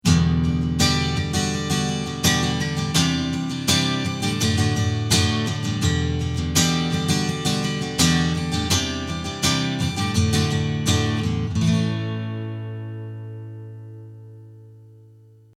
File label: miced clean room